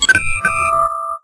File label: comm open, technology federation